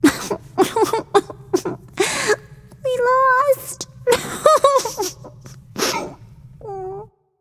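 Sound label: nonverbal boohoo; smite; voicepack; izanami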